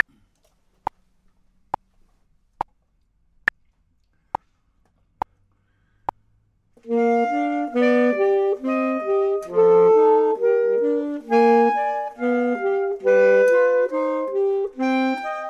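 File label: both, Saxophone and Clarinet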